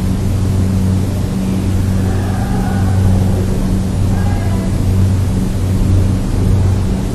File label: screaming